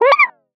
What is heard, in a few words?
Cartoon Squeak